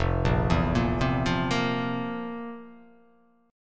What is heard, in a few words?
F Major 7th Suspended 4th; FM7sus4 arpeggiated; JGuitar